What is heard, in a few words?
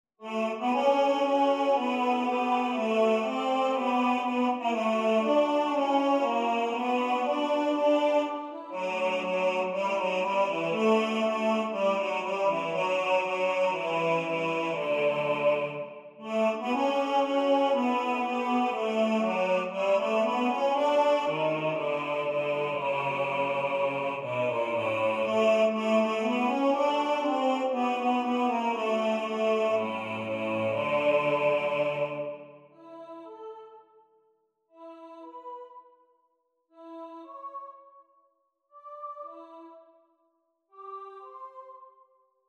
BASS